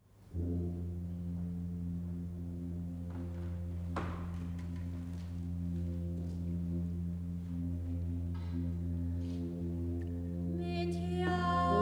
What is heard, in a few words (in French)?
Chant des Visions